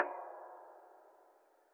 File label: Echo Mic, impulse responses